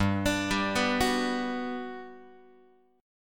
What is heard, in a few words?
G Major 7th, G, down, Guitar; arpeggiated; JGuitar